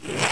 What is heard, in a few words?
Book; DragThing 4.0.2